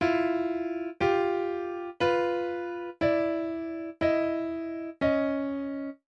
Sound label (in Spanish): Intervalos disonantes